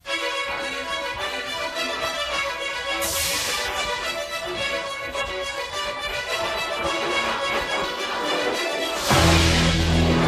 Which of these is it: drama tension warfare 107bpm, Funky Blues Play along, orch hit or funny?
drama tension warfare 107bpm